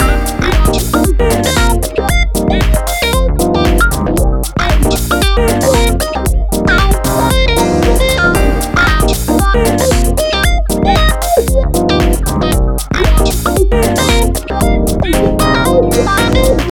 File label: Frisky Bouncy Bendy; Sony; Xperia Movie Creator Music